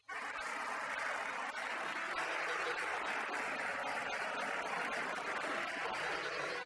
Castanets Violin Applause

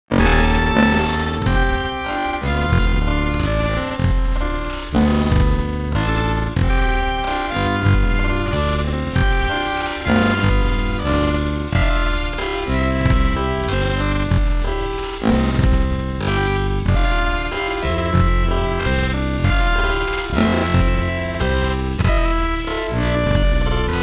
Jazz

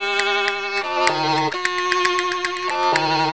Castanets Violin; TSMtoolbox

